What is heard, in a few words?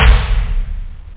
boost tom; toms